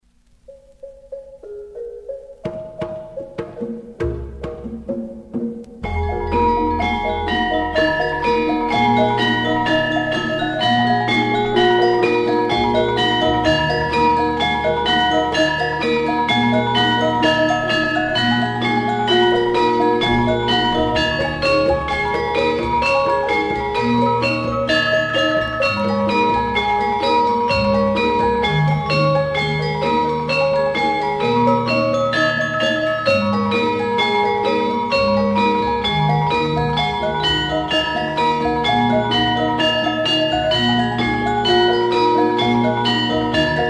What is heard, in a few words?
Javanese Court Gamelan